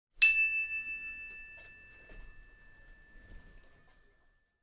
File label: wink